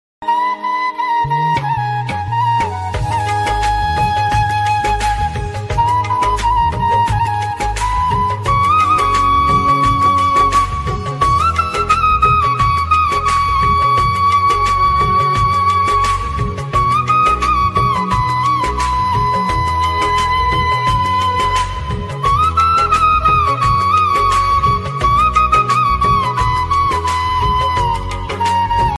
flute instrumental song tone ringtone; bollywood

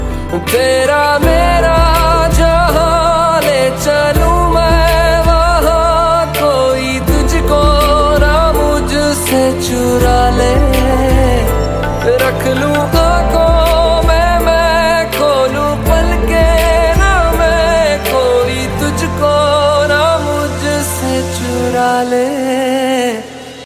bollywood ringtone

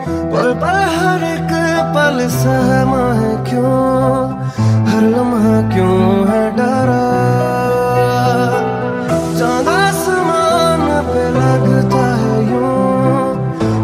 bollywood beautiful music ringtone

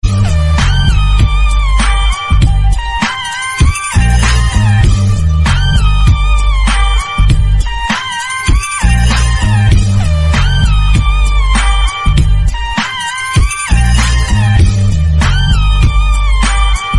classical musical ringtones for mobile

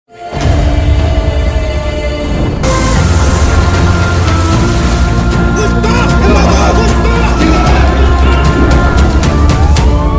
background music tone ringtone; where is pushpa

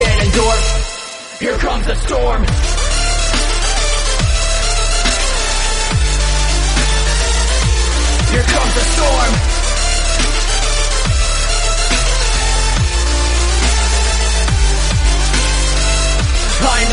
dubstep ringtone

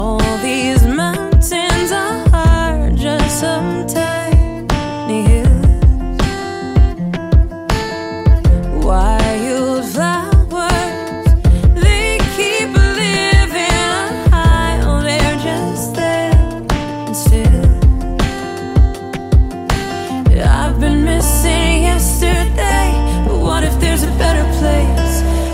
english music ringtone

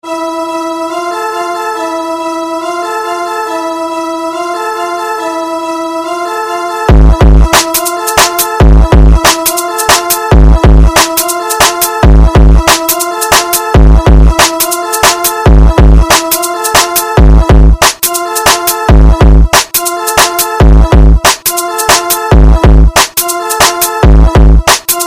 base booster ringtone

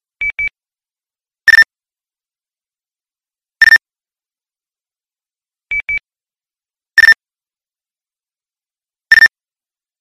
alert beep ringtone

